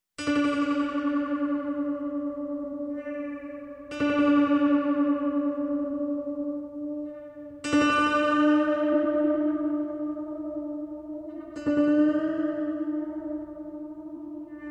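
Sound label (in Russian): имитация фисгармонии на зловещем фортепиано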